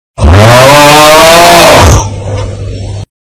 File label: aaauuuuugggghhhhh very loud